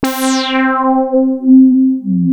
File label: Prophet 5 C5; Hollywood Edge - Giorgio Moroder Rare Synthesizer Collection